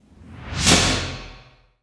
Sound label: metal head; ILIO; Trance Fusion